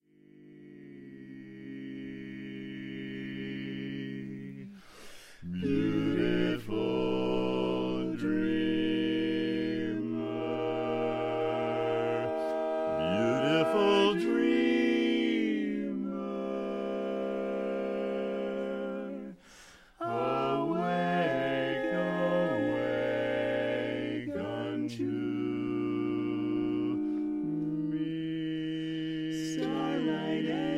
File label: Barbershop Quartet